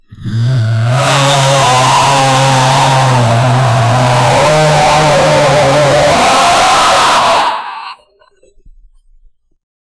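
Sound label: a humanoid black monster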